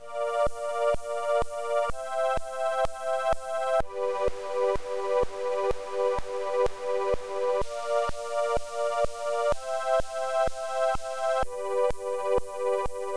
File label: three channel practice; weeklybeats-2020